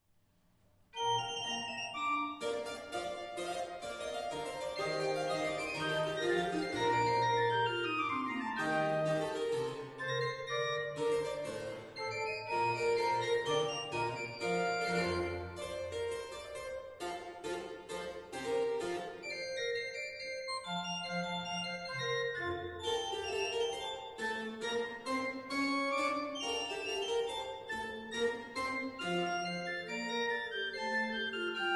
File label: cembalo; hudba